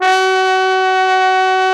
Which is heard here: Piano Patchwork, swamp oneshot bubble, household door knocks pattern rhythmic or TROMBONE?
TROMBONE